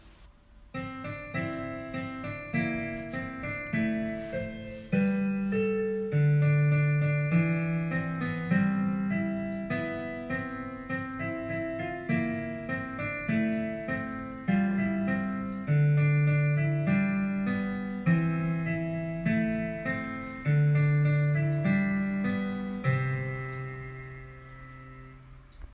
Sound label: Instrumental